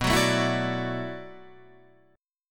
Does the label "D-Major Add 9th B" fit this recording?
Yes